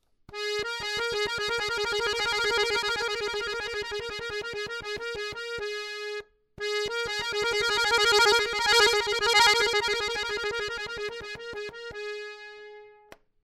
trill real

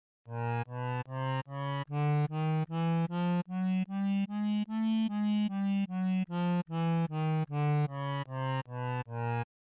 third sample scale

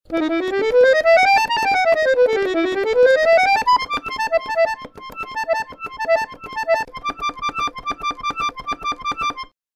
run real

